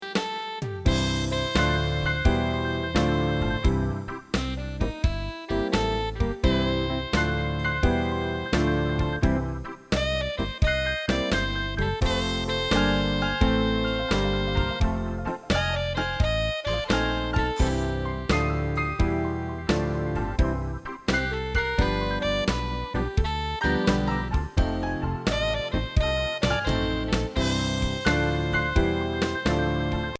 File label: Space Shuttle Blues, piano